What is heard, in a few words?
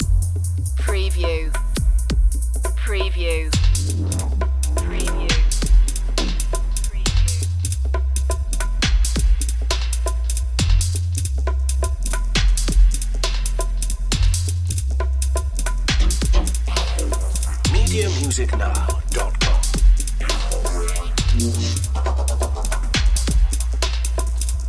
darkness loop